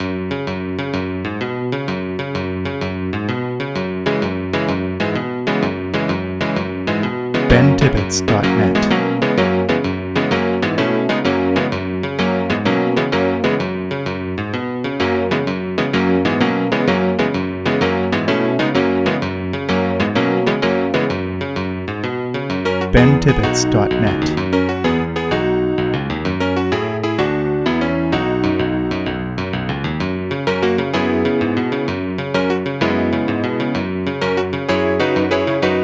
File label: shuffle; postcard